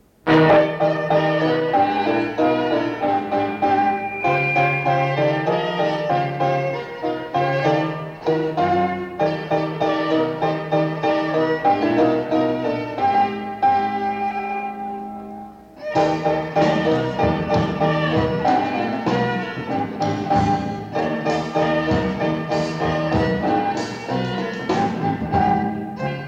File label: piano with band